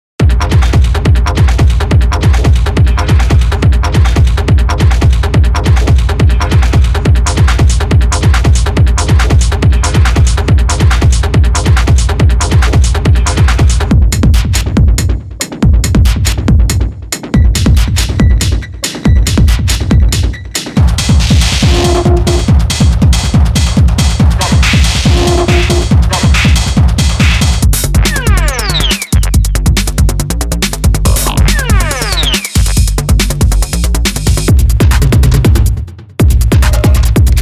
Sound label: Broken Techno Grooves; Riemann Kollektion; Broken Techno Grooves 4